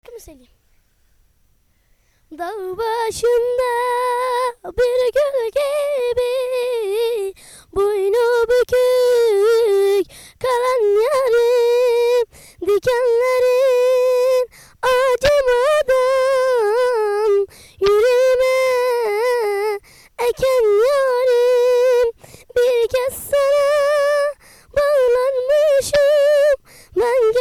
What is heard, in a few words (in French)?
chant Petite